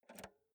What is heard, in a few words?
insert jack multi socket